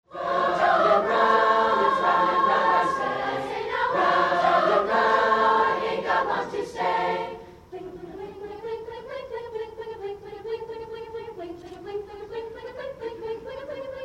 Chorus Festival; Maine 2001 District II; Old Joe Clark (Goetze)

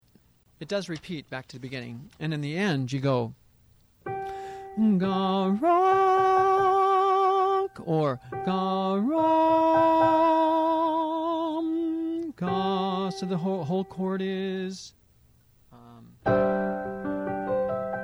Soprano Ending; Russian Picnic; District II 2003; Mixed Choir Practice

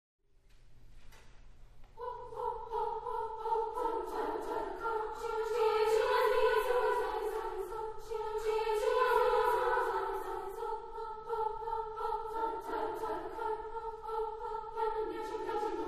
Womens Choir - Hotaru Koi

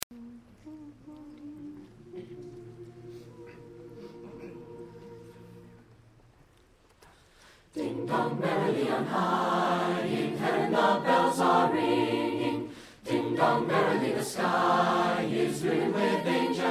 Choir, Ding Dong Merrily On High; 2001-12-19 Deering High Winter Concert

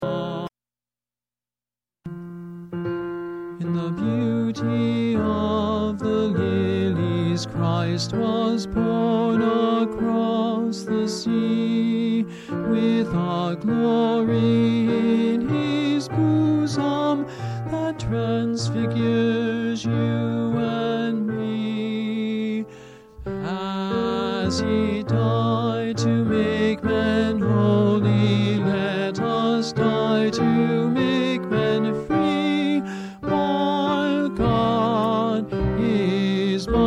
Tenor II; Battle Hymn; District II 2003; Mixed Choir Practice

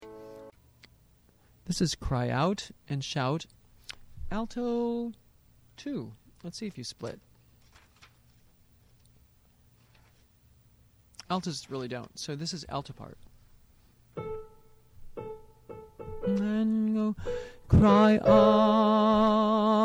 Alto; District II 2003; Mixed Choir Practice

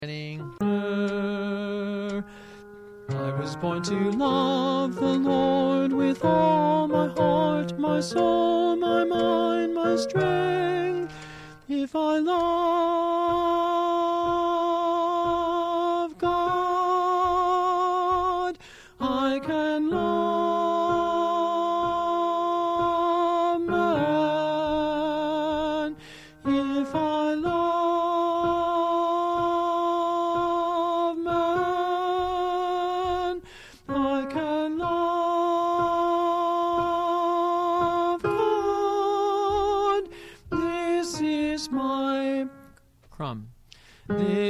Tenor Coda; District II 2003; Mixed Choir Practice

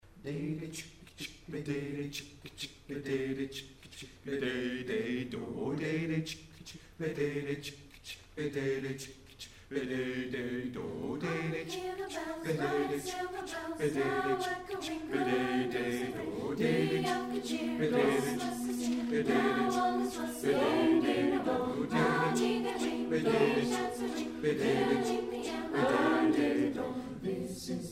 Chorus, Carol of the Bells (Jazz); Portland, ME; DHS Chorus 2000

